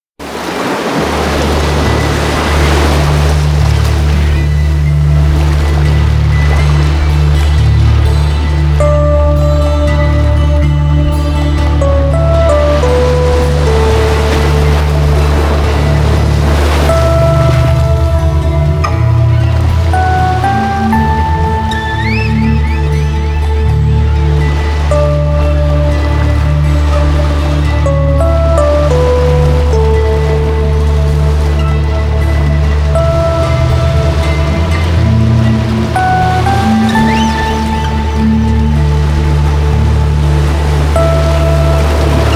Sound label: Symphony of Whales; Nature Sounds; Nature Chill Out 2014